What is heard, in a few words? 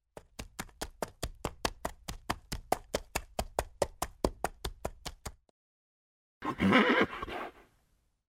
Horse; cinematics; Age of Empires III - Complete Collection; Microsoft Studios